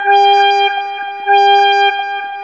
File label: SIREN, SCI FI ALARMS; Ambient; Zero-G; Ambient 1